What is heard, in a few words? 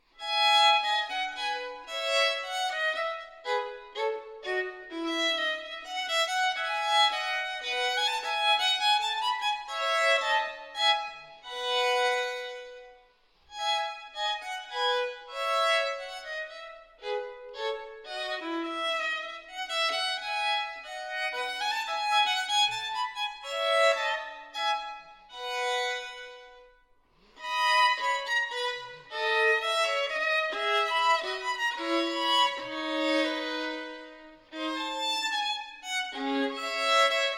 violin partita in e major menuet; violin partita no 3; bwv 1006; menuet i and ii